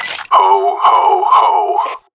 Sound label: play button; christmas